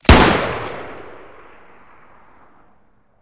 revolver, Guns Fire

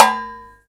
tray hit

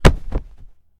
bodyfall

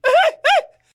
charge, Voices Emotes

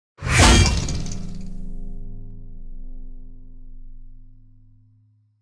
tank ricochet; Vehicles Tank

